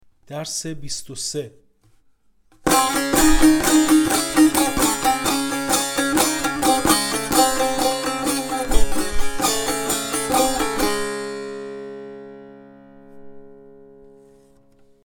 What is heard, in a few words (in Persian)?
مشق تنبور; تنبور جلد دوم; هفتاد و دو مشق تنبور